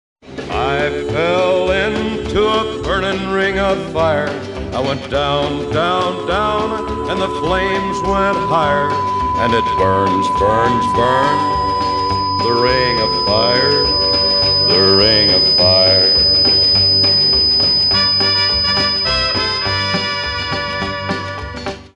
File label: Rain Fire Noise; Filter Design